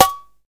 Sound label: snare single hit c sharp key; snare drum samples